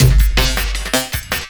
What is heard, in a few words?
LOOP, 160 BPM, Drum & Bass